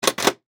Steampunk Button, Scifi, Steampunk, Button, Switch; Science, Fiction; Steampunk Sound Effects; BlastWave